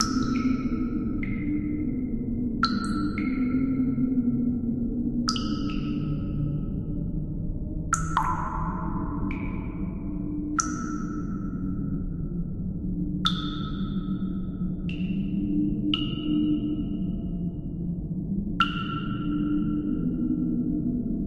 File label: sewers; stendhal